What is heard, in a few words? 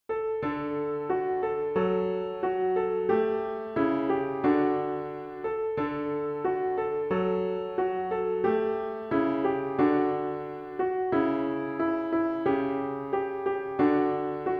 piano